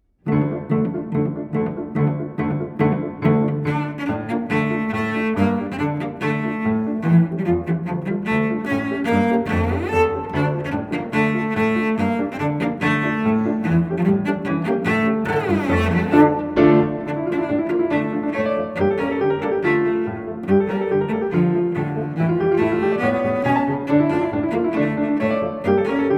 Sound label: Cello Piano; Budapesto; Cheney